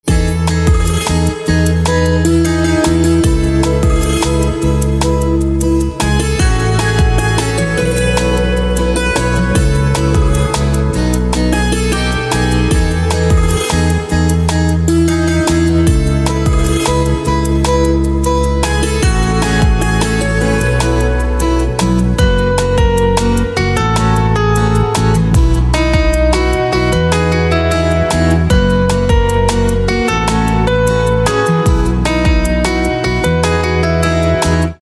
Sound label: Santoor Ringtone